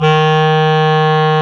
WND CLAR2, Clar Jazz; Roland LCDP04 Orchestral Winds; 90 s Sample CDs; Clarinets

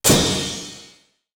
BRASH CYMB, PROC. CYMB; East West; Global Sounds

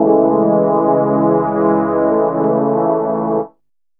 DR. LOOP, TRANCE; X-Static Goldmine 3

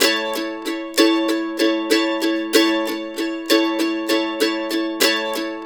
RIFF, Blues Guitar Samples; ILIO; Fretworks; CEE RIFF

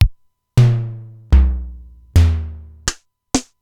Simmons SDS-9; Factory B3

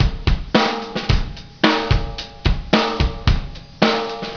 110bpm Beat; Sonic Foundry (Sony Creative Software); Crimson Blue and Fabulous Horncraft 4 RnB

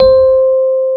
C 4, Electric Pianos; Wizoo

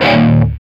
E 3, Soldano rock guitar; Spectrasonics; Hans Zimmer Guitars VOL-1

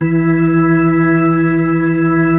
B-3

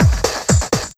Beats, Synchro Funk; Tekno; Sonic Foundry (Sony Creative Software)

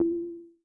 Perc Reso, Vermona Mono15 Drums

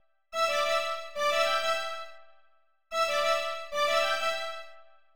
strings, analog funk

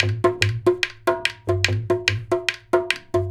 KHART, Indian Traditions; USB Soundscan vol.45 - Indian Traditions; AKAI

